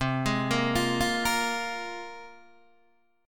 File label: C 7th Suspended 4th Sharp 5th, Guitar, DADGAD